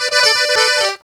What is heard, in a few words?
Accordion Lik 139 G; Zero-G Kocktail Kollection; Accordion Lix